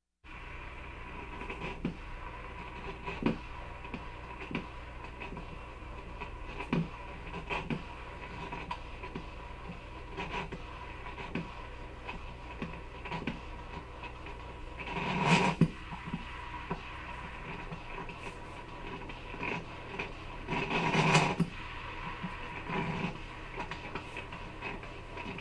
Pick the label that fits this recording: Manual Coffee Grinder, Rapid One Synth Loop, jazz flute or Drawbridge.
Manual Coffee Grinder